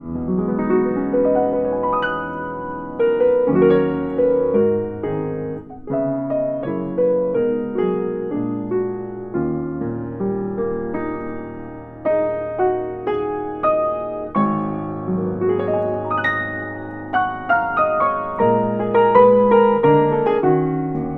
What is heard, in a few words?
Freshly tuned, open piano